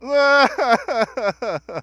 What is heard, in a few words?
sadness